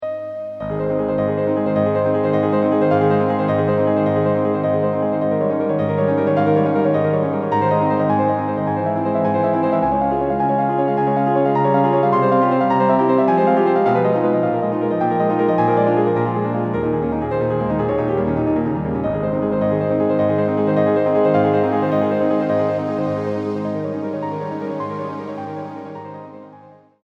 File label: etude in a flat